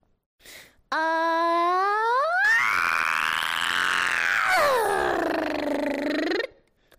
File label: high tone